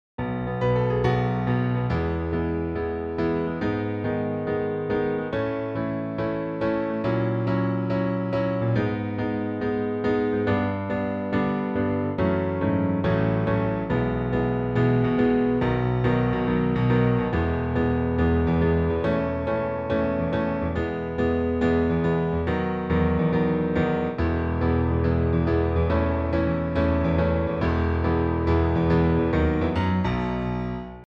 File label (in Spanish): tono 4 piano solo; convocatoria a audiciones el mago de oz